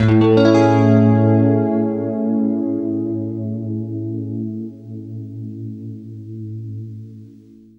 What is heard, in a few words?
FLESH CHORDS, Guitar